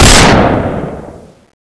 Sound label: vulcanus; cstrike